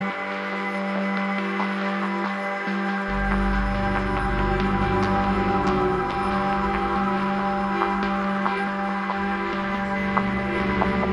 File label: Underwater Drone